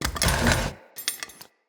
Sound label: Warrior Statue Bust Pickup